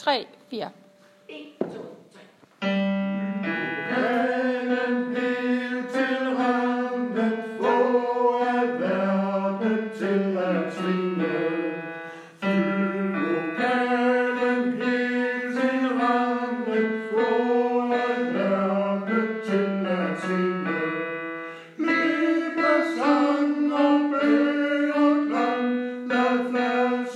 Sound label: tourdion tenor